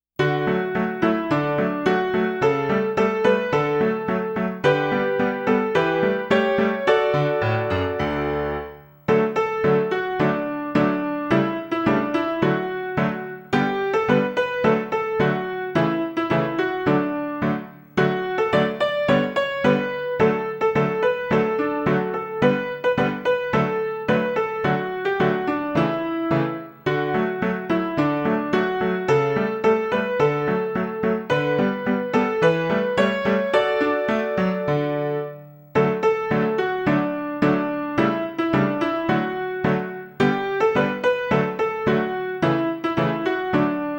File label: school song karaoke